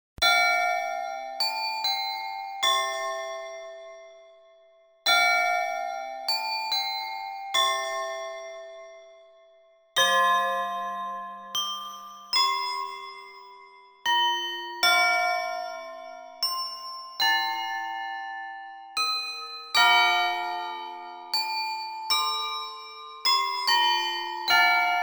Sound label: glocken